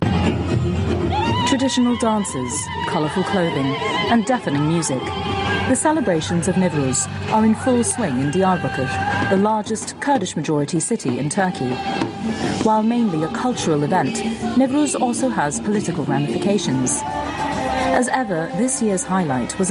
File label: mideastern scream; No Agenda